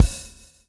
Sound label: swing